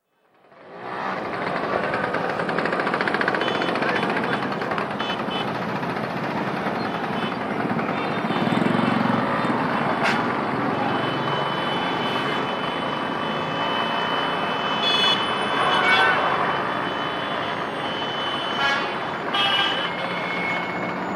Greece: Traffic during election rally, with very heavy traffic, car horns & hooters, also shouting & sirens; Cars; BBC